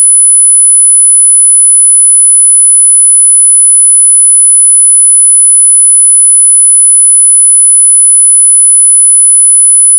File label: 10kHz